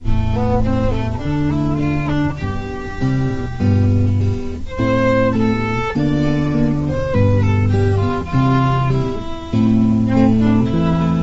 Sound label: instrumental